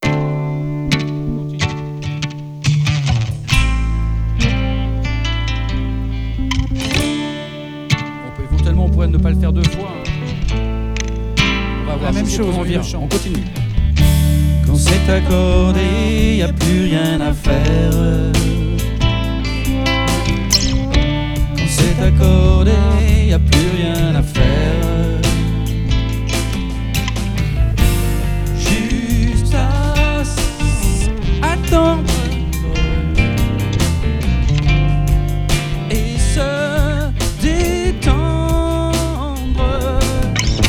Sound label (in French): accordé